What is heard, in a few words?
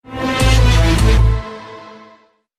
sms ringtone bmw